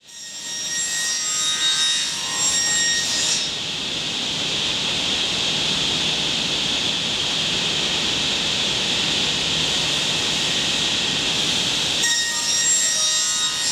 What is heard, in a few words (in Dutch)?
cirkelzaag timmerfabriek, Ruud Driessen aan het werk; Ruud Driessen aan het werk in het familiebedrijf opgericht in 1928 door zijn opa en vanaf 1994 gevestigd aan de Orionstraat 12 in de Binckhorst